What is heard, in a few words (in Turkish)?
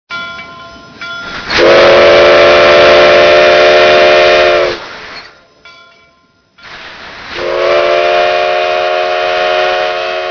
tren düdük sesi; cep telefonuna tren duduk sesleri